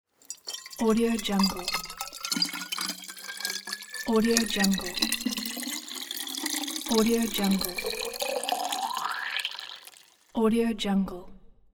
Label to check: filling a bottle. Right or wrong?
Right